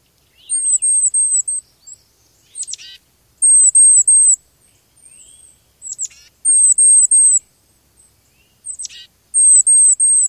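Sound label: tufted titmouse see-see-see calls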